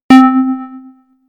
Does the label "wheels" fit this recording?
No